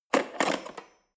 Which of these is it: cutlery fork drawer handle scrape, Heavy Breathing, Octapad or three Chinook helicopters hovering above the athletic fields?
cutlery fork drawer handle scrape